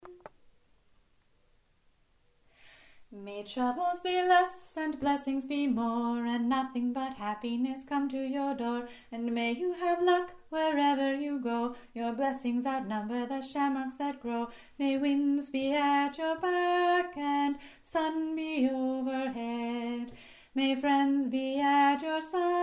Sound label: Melody; Irish Blessing